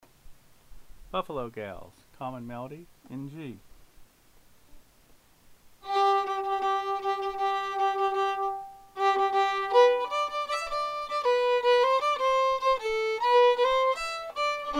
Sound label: in G fiddle; Buffalo Gals